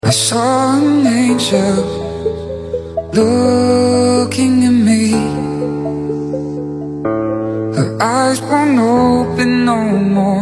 remix

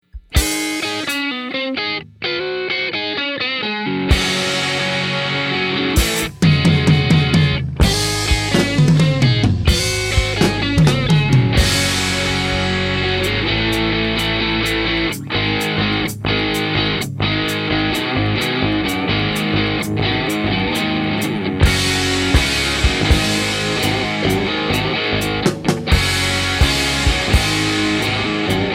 rock n roll

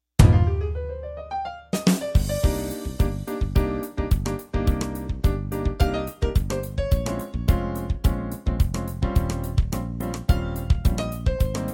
jazz samba

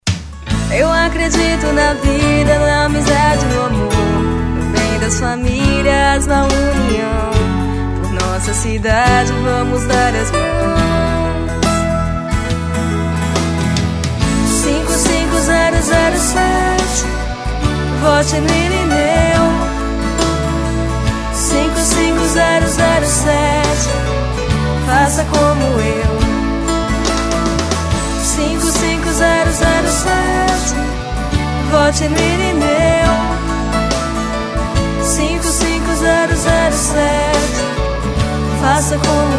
jingle; irineu vereador

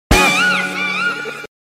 coyote

